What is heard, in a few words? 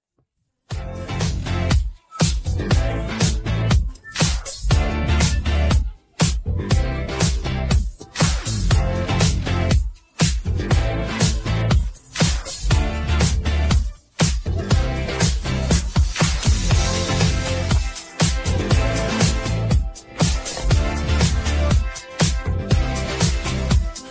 Instrumental